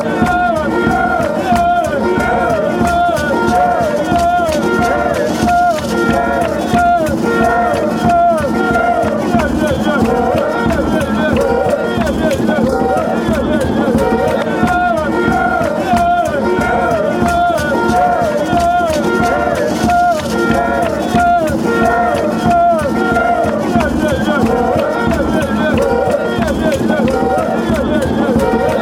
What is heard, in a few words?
oh oh oh ghana fanchants